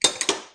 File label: tools